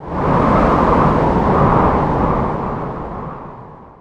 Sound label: windgust, ambient; Garry's Mod; tf2